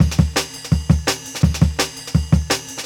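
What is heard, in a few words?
Jungle Breaks, 168 bpm; Original Jungle Breaks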